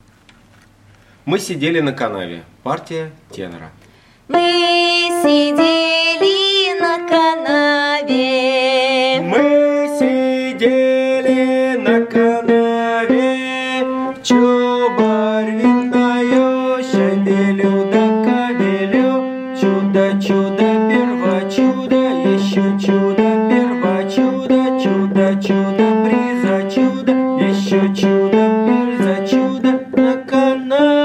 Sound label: My sideli na kanave tenor